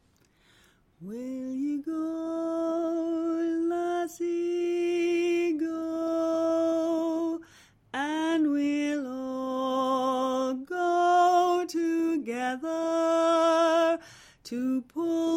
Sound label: Chorus harmony; Wild Mountain Thyme resources